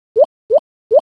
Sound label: emoticon